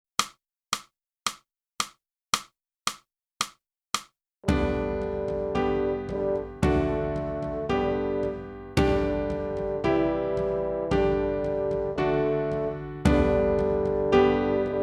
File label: clarinet